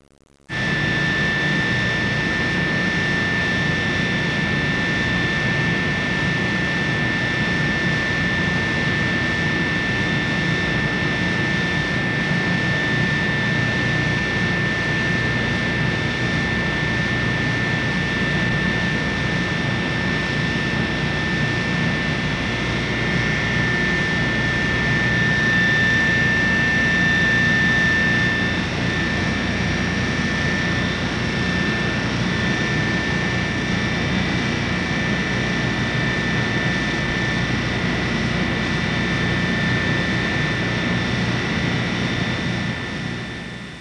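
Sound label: generator, energy, ambient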